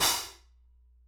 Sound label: Soft Xtra Dark Long, Hats; Airwindows